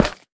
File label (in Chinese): 蛙明灯踩踏声